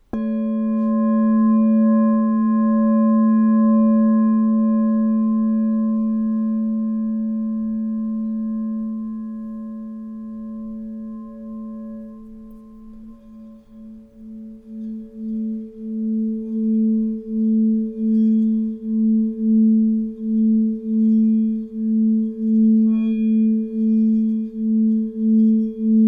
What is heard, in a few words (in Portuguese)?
TACA A3, instrumentos de quartzo; escutar instrumentos de quartzo